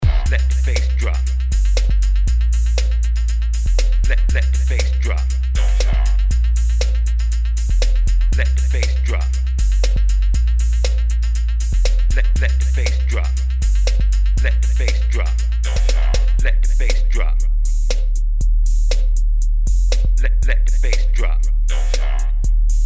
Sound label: let the bass drop